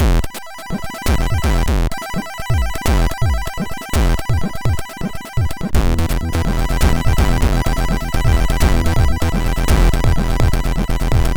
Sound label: Screwed sid MOS6581R4; hvsc